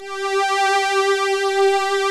ANALOG STRING; OMI - Universe of Sounds

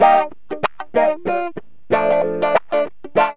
GTR, CHANK; Zero-G; Funky Elements

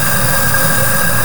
VOX NOISE; AKAI S6000 CD-ROM - Volume 5

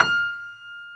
pno F5, Piano Grand; AKAI S-1000; Retro Sampler; Piano Grand PF St

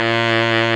BigBand Fast BARI

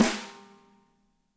MEDSNR, ROCKER KIT

